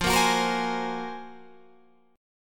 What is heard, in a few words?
F sharp Major Sharp 11th F